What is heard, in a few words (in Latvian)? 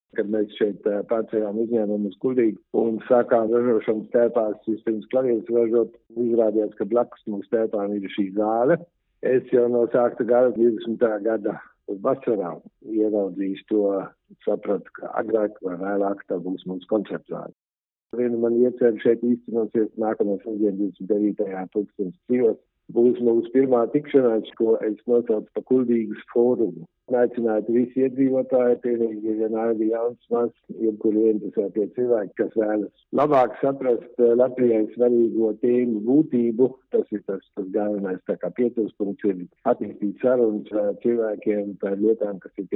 Klavieres